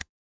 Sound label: ui click